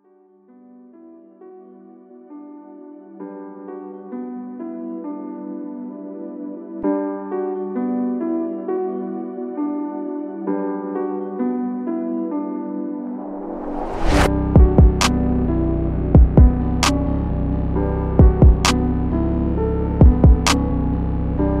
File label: instrumental